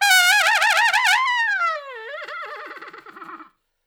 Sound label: Trumpet Noise; Traditional; Sonic Foundry (Sony Creative Software); Jazz Solos and Sections